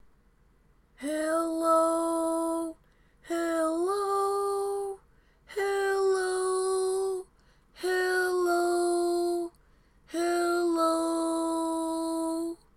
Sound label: Lead, barbershop